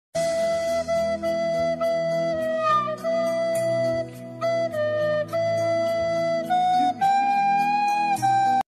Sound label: fail music, meme music